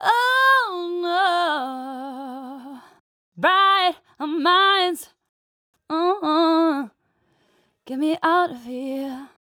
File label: 122 Cm Ohhhnooow